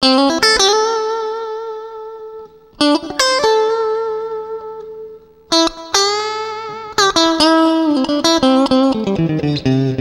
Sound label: Bluesy Muse